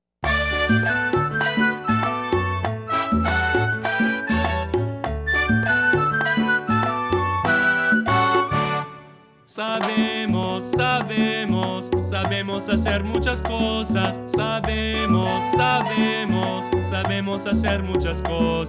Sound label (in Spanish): Sabemos! (Vocals)